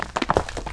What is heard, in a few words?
rocks; Tanks